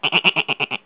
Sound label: Bleet